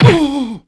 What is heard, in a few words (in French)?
poing